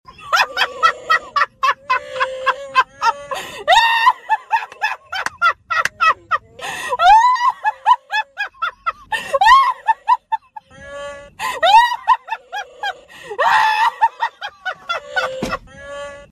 laugh

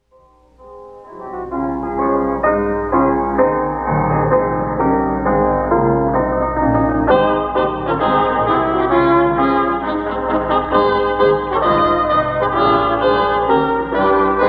down at the cross instrumental; hymns

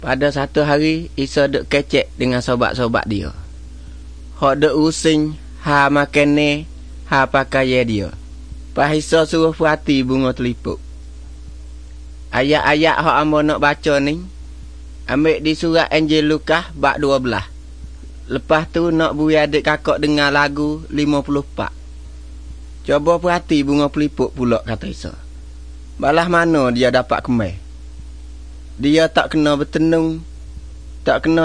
songs malay pattani

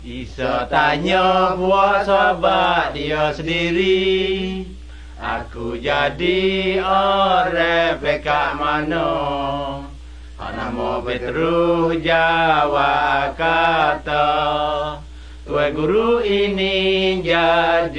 songs malay pattani

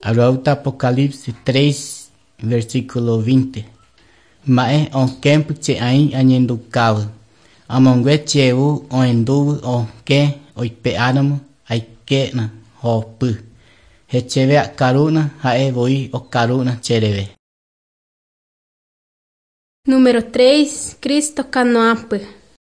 songs guarani mbya; bible

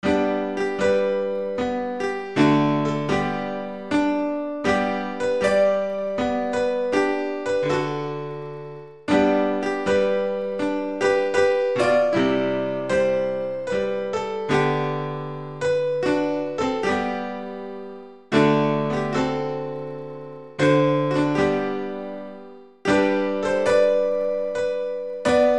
instrumental hymns; close to thee

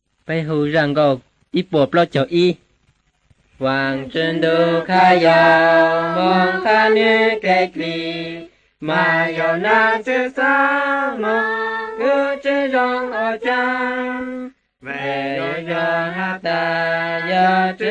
songs hmong blue; bible

